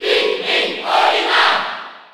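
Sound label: Cheer French, crowd; Olimar; SSB4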